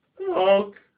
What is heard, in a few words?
Goose Honk